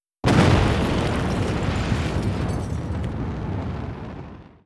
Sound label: nuke; Hearts of Iron IV Death or Dishonor